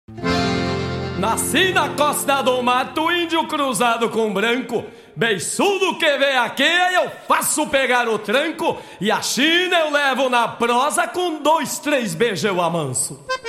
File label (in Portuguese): batidão gaúcho sem vinheta